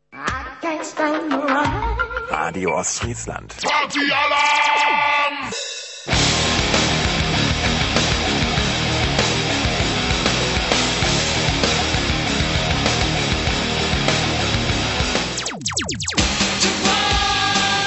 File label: Partynight Jingle